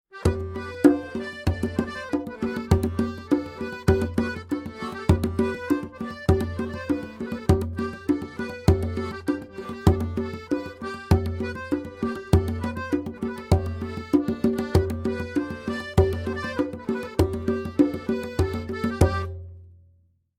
Congas; 16 bit

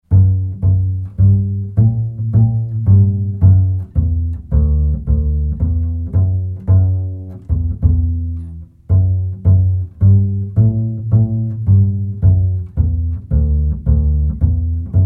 Kontrabas; 16 bit

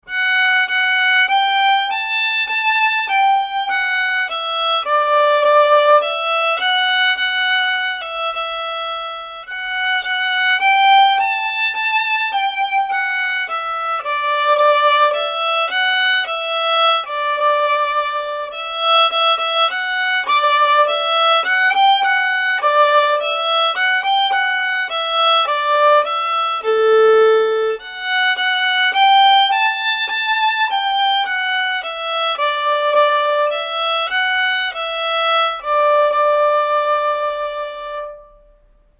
Fiol; 16 bit

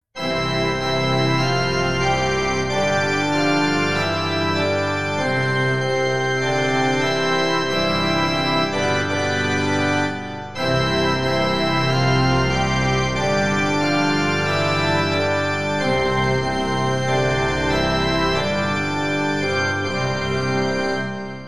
Kyrkorgel; 16 bit

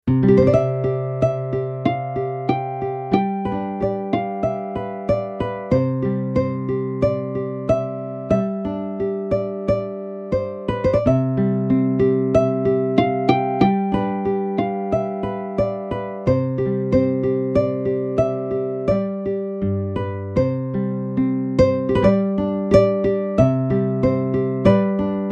Harpa; 16 bit

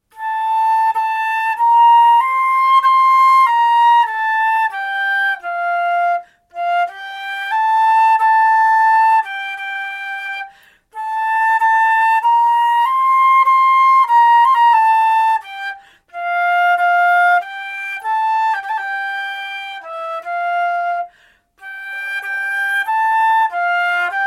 Tvarflojt; 16 bit

